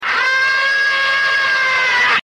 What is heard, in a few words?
yell